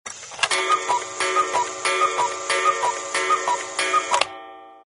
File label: cuckoo clock strikes six